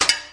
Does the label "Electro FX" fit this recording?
Yes